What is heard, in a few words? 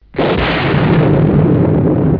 thunder; Prisoner; Arrival